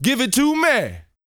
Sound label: VOCAL F; ILIO